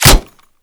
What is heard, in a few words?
impact helmet